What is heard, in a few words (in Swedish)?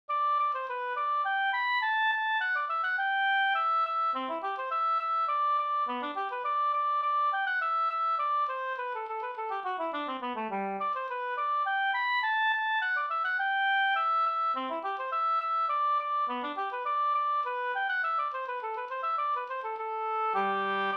slangpolska